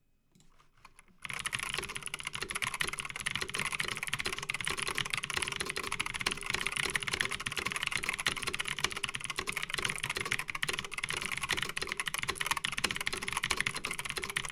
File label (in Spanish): Sonido Razer Blackwidow V3 Quartz